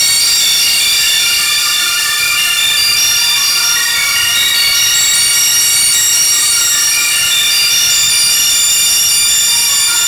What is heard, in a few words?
boss fight synth; Lucah: Born of a Dream